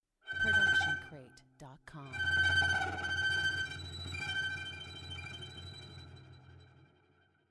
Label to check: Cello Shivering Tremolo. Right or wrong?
Right